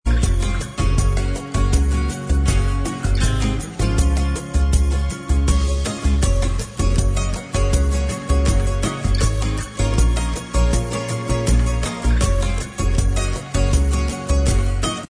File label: bossa Inst